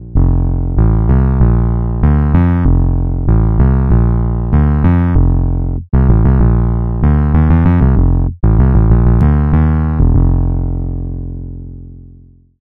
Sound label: bass electric synth